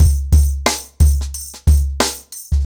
Nuclear 90BPM, ACOUSTIC DRUMS

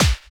Snare, drums; Sonic Foundry (Sony Creative Software); Drums & Drones; Construction Kits; Within Kit

